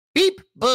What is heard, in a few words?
beep boop; Alastor